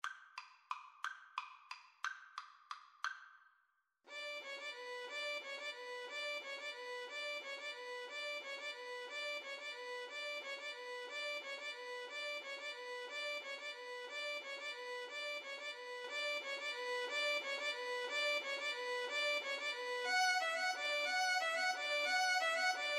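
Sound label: violin double bass, Violin-Bass Duet; carol of the bells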